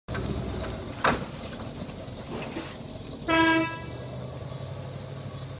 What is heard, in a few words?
fiam cadillac horn; فیام کادیلاکی